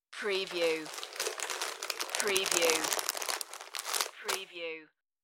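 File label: Plastic Bag Crackle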